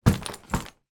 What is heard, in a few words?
suitcase put down on floor